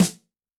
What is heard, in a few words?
Roll Em, Yamaha DTXpress-II snare